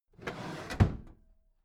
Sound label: packed drawer smooth clatter close heavy